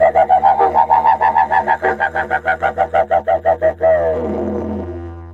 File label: F1 wild, didgeridoo; Big Fish Audio - Didgeridoo and Other Primitive Instruments